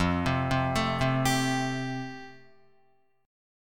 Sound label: F Major Add 9th down, Fadd9 chord, Guitar; arpeggiated; JGuitar